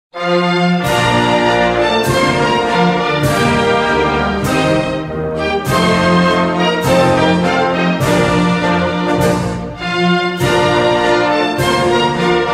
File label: instrumental; The Internationale